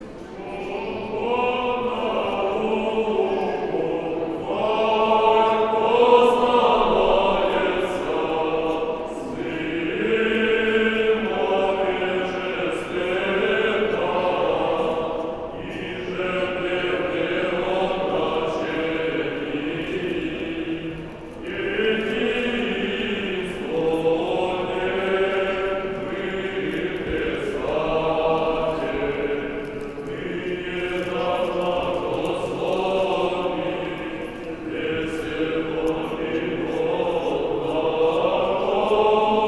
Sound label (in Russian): Богоявление Ирмос 2 творца 8 песни; Свободна убо